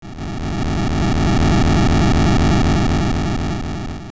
Dig; Lanmola digging through the sand

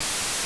Bob-omb Fuse; Zelda; MC

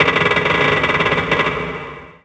Ship Creak

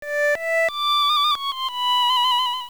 Recorder, Playing the Recorder; LOZFDS

